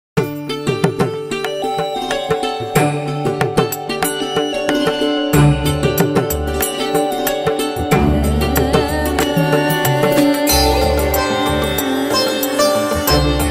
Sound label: Indian Instrumental Music